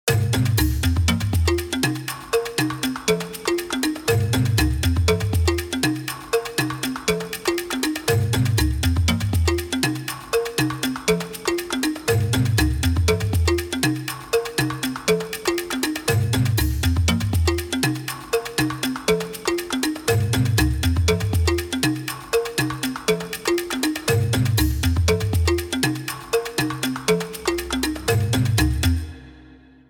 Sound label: Jungle jingle; Jingles medley